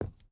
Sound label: footstep boots wood walk; Rust